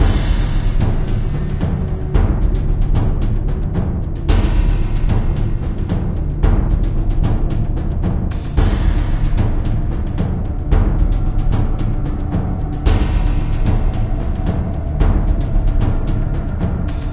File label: Into The Darkness - Battle - Ambient Loop